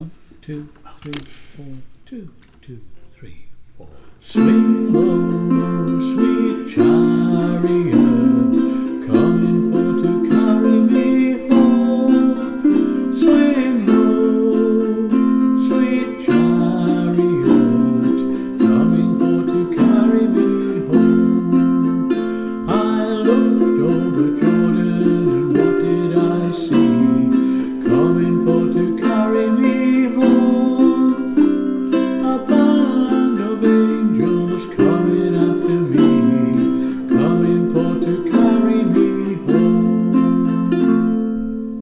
Playalong File; Swing Low Sweet Chariot

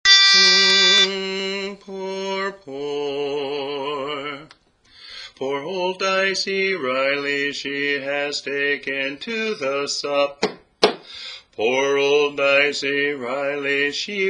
bass; Dicey Reilly

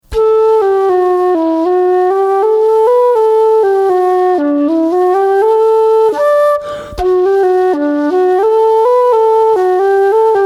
Reel Slowest

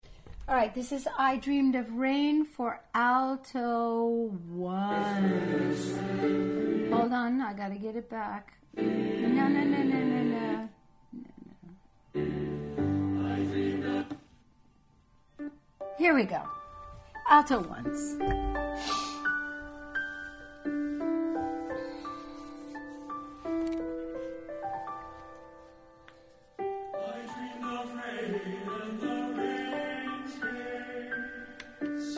Alto

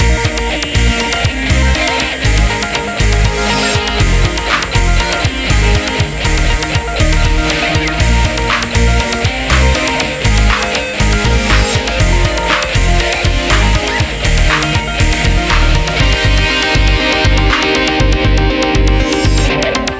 Music

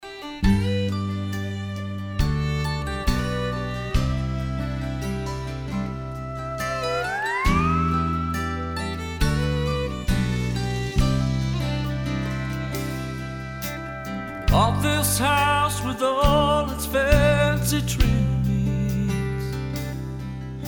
SONG

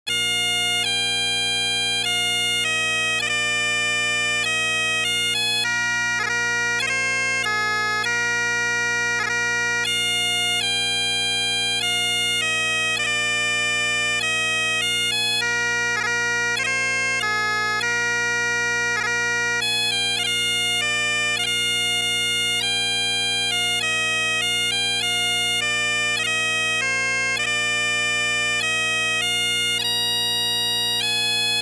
Blue Bells of Scotland Harmony

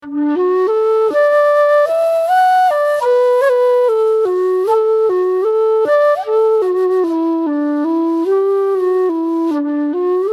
Slowest; Jerrys Beaver Hat

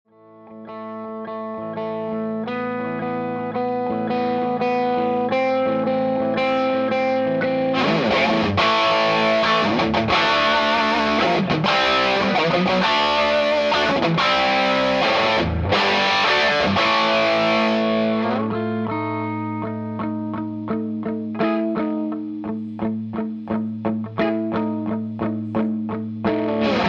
Rock Transition

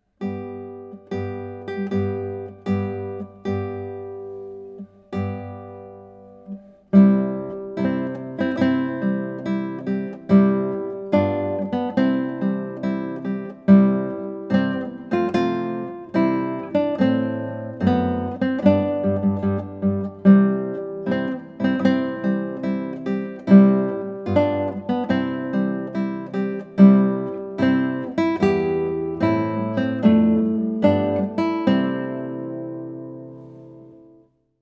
Here Comes the Bride - guitar

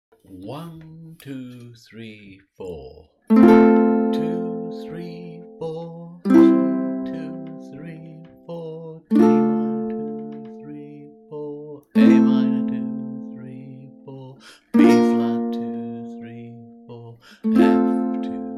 Chords; Pachelbel

